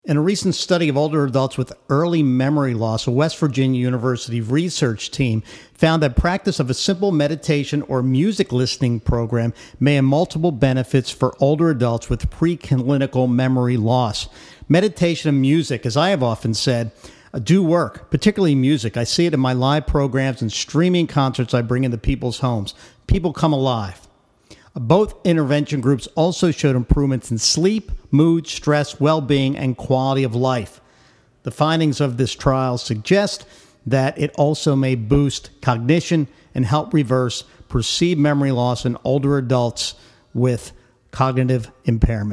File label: Meditation and Music